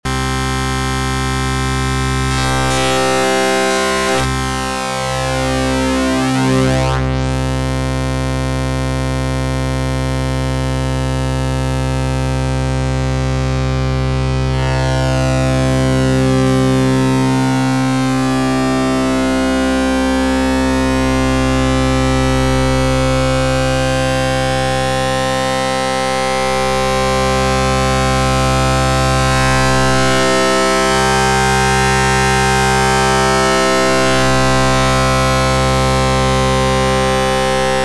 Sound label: Noise